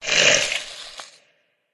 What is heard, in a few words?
riding bug attack voice